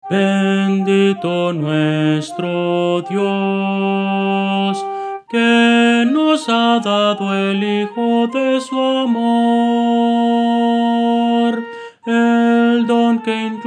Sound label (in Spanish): soprano, Himnos Cristianos; bendito nuestro dios